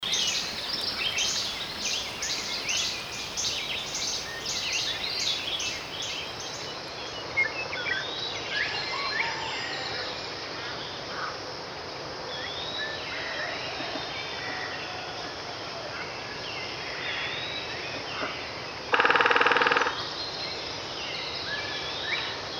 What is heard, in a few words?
Bobwhite, Doves & Cardinals, Morning Songbirds